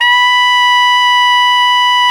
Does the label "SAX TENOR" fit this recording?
Yes